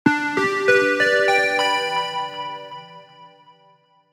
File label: Clean Bells; jup8